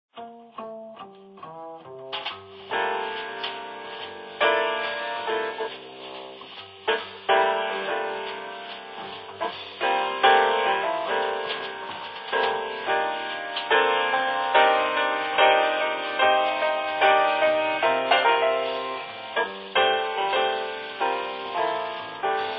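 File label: Music Ambient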